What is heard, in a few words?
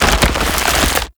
Spawn Pod Grow; Warframe; Infested